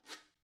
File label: guiro short